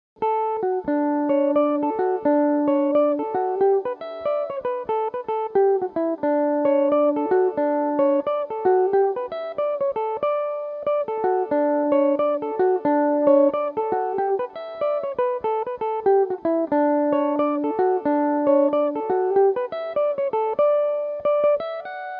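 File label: jig version d major, mandolin; crown at portpatrick; belmuse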